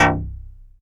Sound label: MTL, SYNTH BASS; East Collexion; Bass S3000